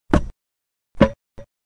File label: Axe Chop; Survivor The Interactive Game